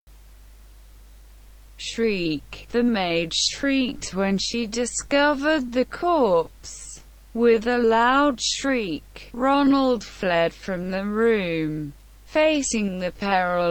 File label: shriek